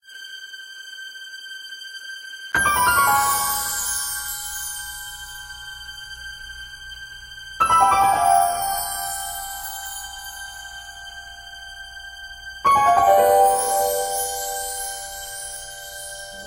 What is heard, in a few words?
Nostalgia Stinger